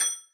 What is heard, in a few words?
marimba; ゆめ2っき